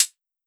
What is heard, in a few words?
Closed Hats